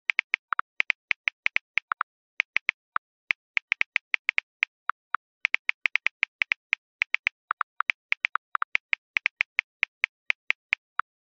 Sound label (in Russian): Звук печатает на смартфоне быстро